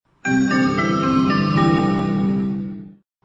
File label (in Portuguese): som de sinalizacao